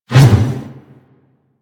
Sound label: Mace Whoosh Slow; Warframe